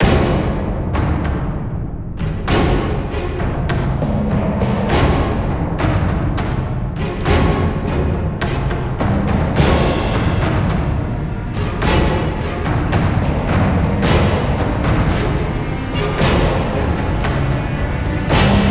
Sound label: Valor Crash